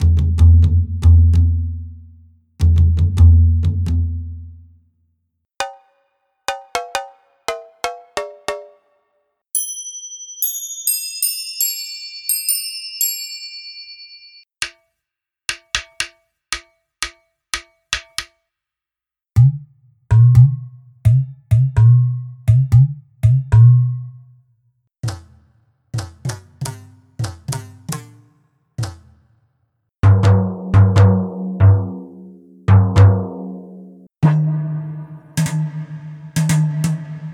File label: Perc Traditional; CinemorphX Presets SC